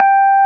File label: SUIT AMP G4, Keyboards Old School; USB Soundscan vol.09; AKAI